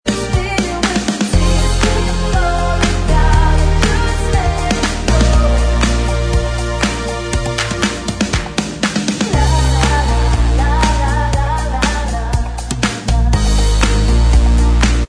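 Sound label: Backing vocals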